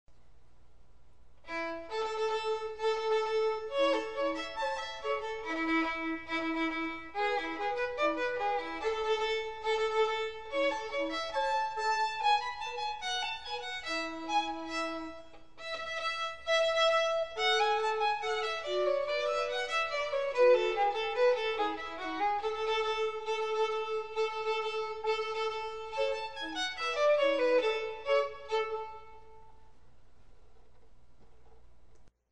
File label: Trumpet Hornpipe